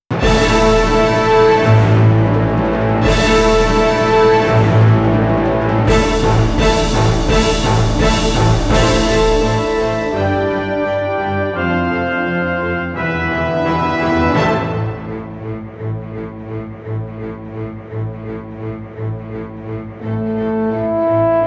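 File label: background music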